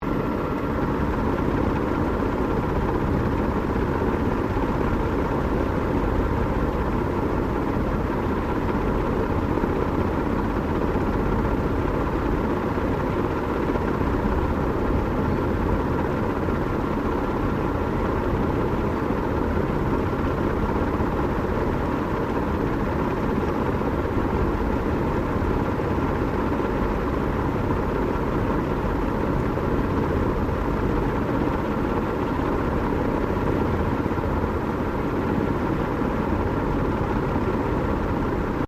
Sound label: FAN CEILING FAST BELOW LOOP; Various fans; Fans (Sound Effects:Electronics)